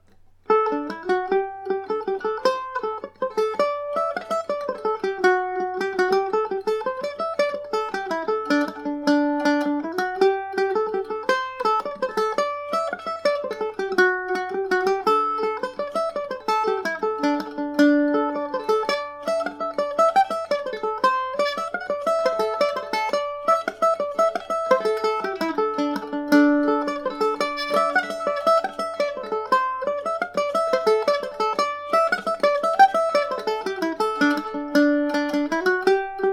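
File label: D mixolydian, Irish mandolin; garrett barrys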